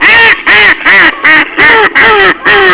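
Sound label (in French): canard colvert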